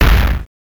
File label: monster orc walking loop